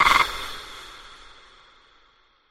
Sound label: cry